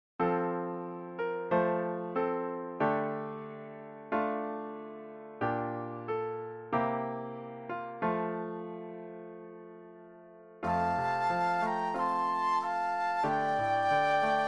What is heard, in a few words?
Flute Duet and Piano; christmas